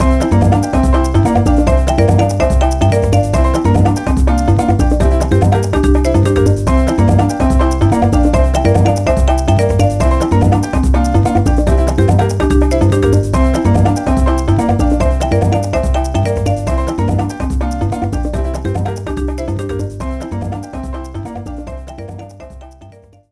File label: beach boss, bpm144; Donkey Kong Country Returns HD